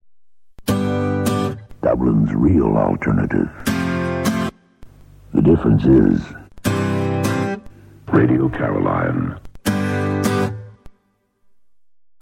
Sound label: radio jingle; Radio Caroline; Dublin